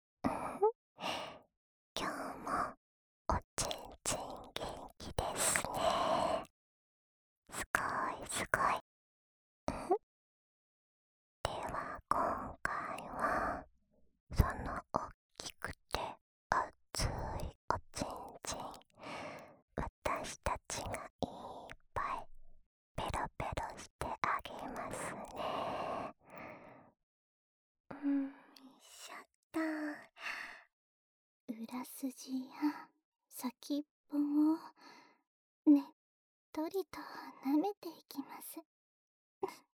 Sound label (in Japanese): Wフェラチオループ; 6時間Wお耳癒しエッチ; 耳かきリフレ『春乃撫子』; オナサポ用